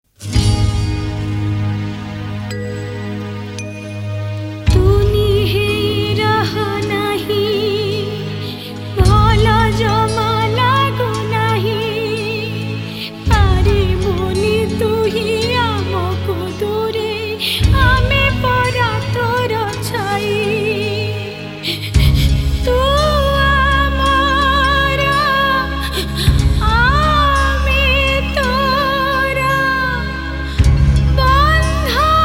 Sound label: sad, Odia Song